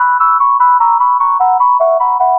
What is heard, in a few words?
Flutey High Cycle, Synthetic Pulses